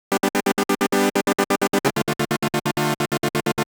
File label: Gated Synthline (130BPM); musicradar